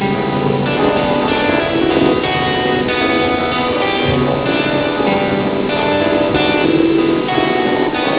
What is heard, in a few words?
musical